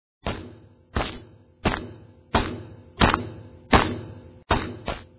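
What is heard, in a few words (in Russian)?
шаги по гравию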